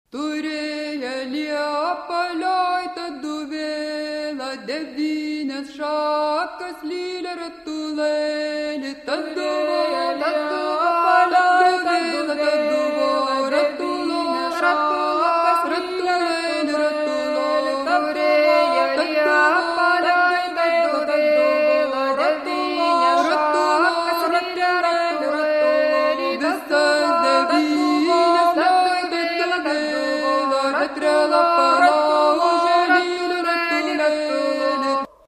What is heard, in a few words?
Sutartine; tureja liepa